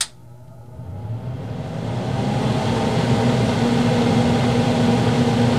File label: vacuum, Motor Misc; SFX